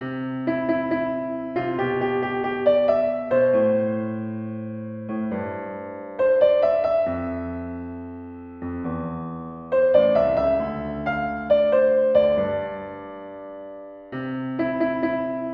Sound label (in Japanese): ピアノ